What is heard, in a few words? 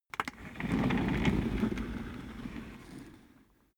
office chair worn trundle long